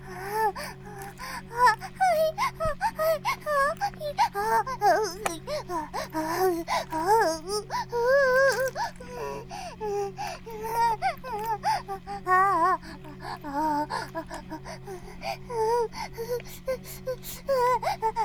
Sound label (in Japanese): バイブ放置, ASMR